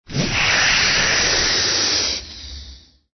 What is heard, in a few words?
ANIMAL CREATURE